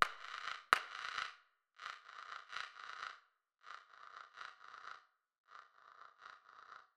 Rhythmic Match, Foley; TRUTH-HZ; Crowdsourced Sample Pack

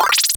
menu; honculus; TRUTH-HZ; Truth-Hz - 1-Hit KO Sample Pack

